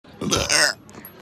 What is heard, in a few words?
burp